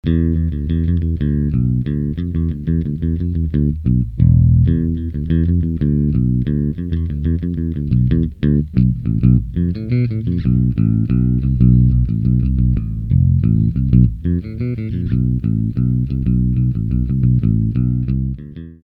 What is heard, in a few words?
Bass VI